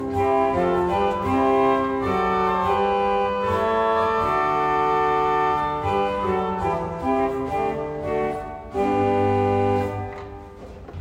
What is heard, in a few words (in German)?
Gamba 8, Orgel; Dettenschwang; Renovierung